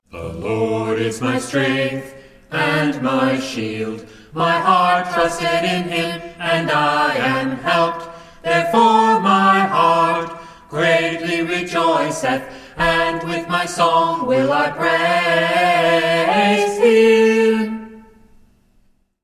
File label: a capella scripture song